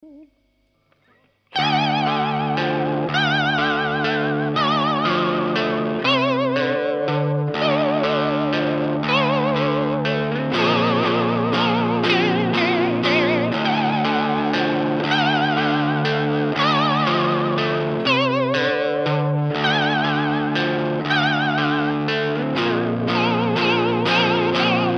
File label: Triangle; Aquaduct